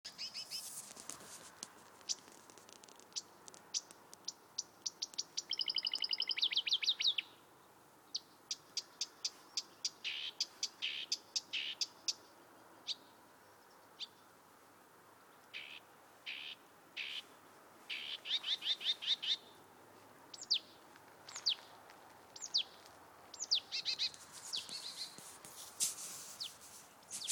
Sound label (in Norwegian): sang, fuglestemme